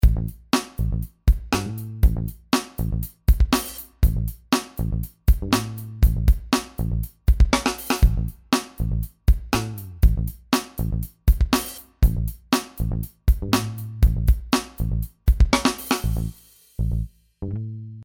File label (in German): mehr Röhre